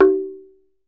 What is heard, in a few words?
TUNG HI, African Perc; E-MU Producer Series Vol. 7 – Old World Instruments